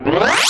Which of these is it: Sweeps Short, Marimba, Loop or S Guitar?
Sweeps Short